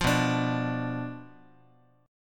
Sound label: B Diminished B down Guitar; Bdim strummed